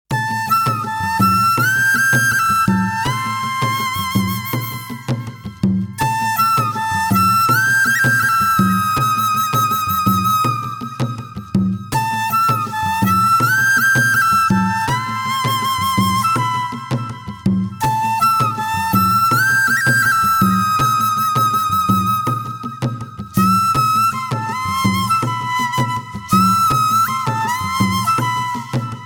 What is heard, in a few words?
When My Heart Goes (Flute); Kokki